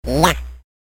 noise

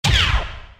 confirm; ddr

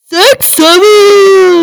click on

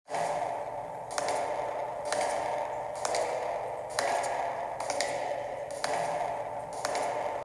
footsteps creepy ghost

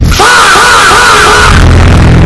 fahh earrape

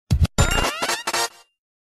get item; zelda

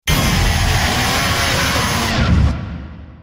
jumpscare; henry

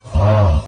augh short

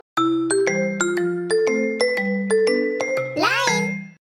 ringtone; line

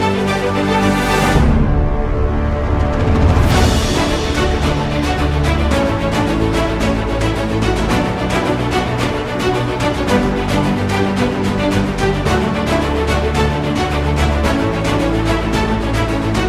campaign music

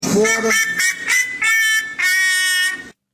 wap wap wap

